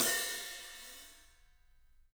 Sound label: ZILDJIAN HT; 3D Sound; 3D Drums